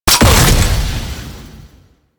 Shotgun Shot Alt A; Weapons; Warframe; Tenno; Alchemist

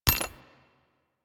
Flak Cannon Shell Impact; Warframe; UT Flak Cannon

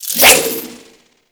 Whip Whoosh; Grineer

